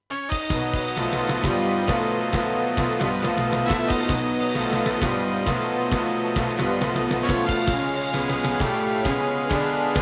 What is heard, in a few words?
SC880; quest for glory 3 wages of war